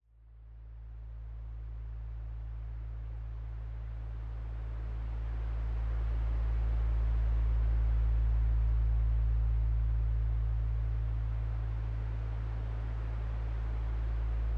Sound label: no pulse